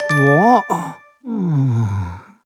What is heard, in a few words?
surprise; roly